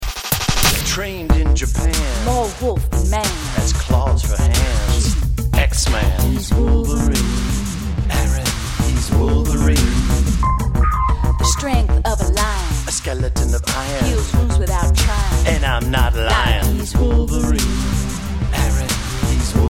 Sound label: Rap